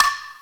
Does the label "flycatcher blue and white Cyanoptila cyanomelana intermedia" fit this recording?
No